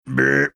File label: imitating a burp